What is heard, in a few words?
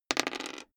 dice roll table hard